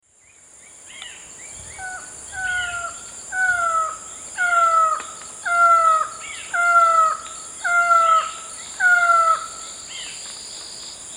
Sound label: Micrastur mirandollei, song; S. America; Eastern Lowlands